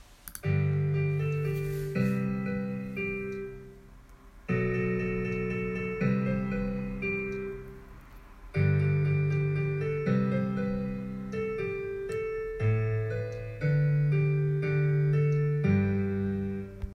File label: Instrumental